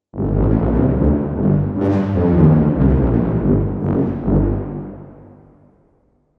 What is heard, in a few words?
Brass Ensemble Tubas Staccato